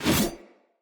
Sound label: Axe Whoosh; Weapons; Enemy; Axes; Glades Axe